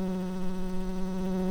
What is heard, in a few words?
bumblebees, Hypnorum workers